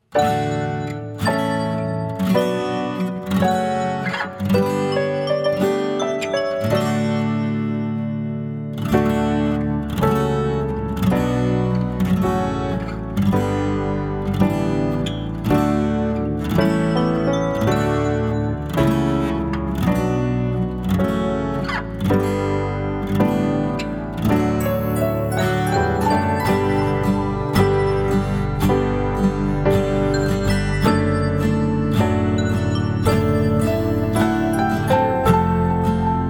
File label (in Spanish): playback el amor vive ninos; semana santa